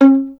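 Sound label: STR violin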